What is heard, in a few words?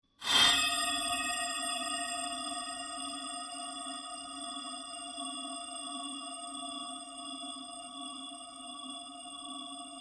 Created Surge Fade Slow, Rise And Dive Sound Effects; sound building blocks created through manipulation of raw material